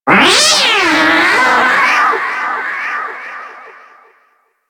Lure Call Success; Warframe; Hunting Critters; Kavat Vasca

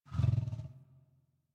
interact sleep affection groan, Kubrow; Enemies; Warframe; Tame

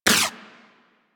Shot, Weapons; Warframe; Moa Pet; Tazicor

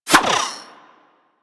Pet Skip Rope Whoosh; Warframe; Moa; Tenacious

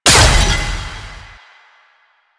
Light Orb Hit; Warframe; Eximus; JadeLight

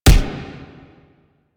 Alt Punch, Weapons; Warframe; Fulmin Prime

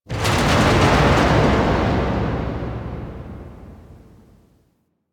Factory Thud; Ambience; Warframe; The New War; Quests; Zariman

